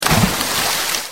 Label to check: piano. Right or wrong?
Wrong